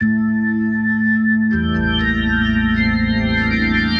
Perp Organ 120 A, Loops n Lines, 120bpm

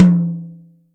Maple, Acoustic Tom, Yamaha DTXpress

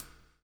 BLUES TK, Backbeat; Spectrasonics - Backbeat

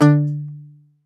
Greek Bazouki, plucked strings

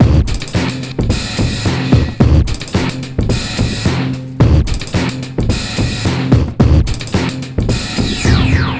HIT THE BREAK 109 bpm; Planet of the Breaks

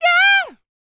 yell, male human, R&B dance vocal

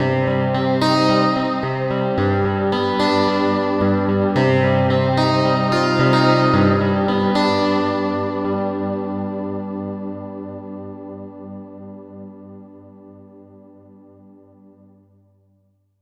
Spook Guitar, Guitar Synths; Dr. Fink's Funk Factory

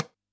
TABLA, PERC

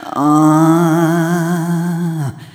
Arrhythmic F

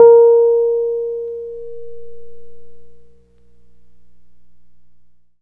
E PNO A#3 MP; Synclavier Sampler Library; KEYS AND GUITARS

